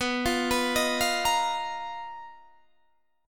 B Major 7th Flat 5th B down Guitar Standard; BM7b5; arpeggiated; JGuitar

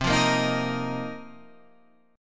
A sharp Suspended 2nd Suspended 4th C

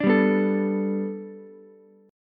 G Major Add 9th Ukulele C6, chord; Gadd9 strummed

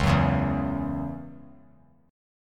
Db Minor Double Flat 5th Db; strummed; Dbmbb5; JGuitar

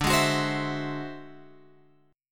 D 9th Flat 5th D, guitar; D9b5 Chord {10 11 X 11 13 12} strummed

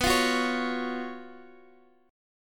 F sharp Major 7th Suspended 4th, B, chord

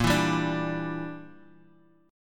A sharp 6th Flat 5th

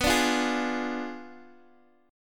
B Diminished 7th B Guitar Open D

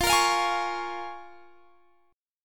Bb Minor 7th, guitar

